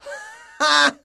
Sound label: Laughter Male Shrt La